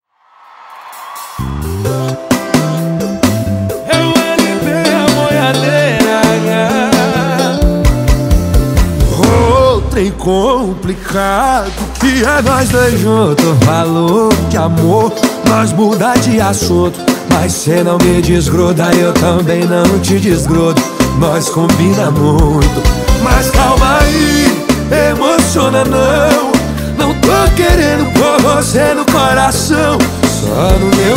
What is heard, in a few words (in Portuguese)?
ao vivo, sertanejo